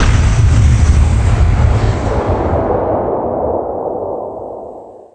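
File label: ship weapon; imp devastator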